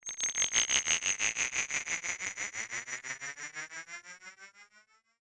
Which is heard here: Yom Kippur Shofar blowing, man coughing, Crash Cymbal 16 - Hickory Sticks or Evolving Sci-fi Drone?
Evolving Sci-fi Drone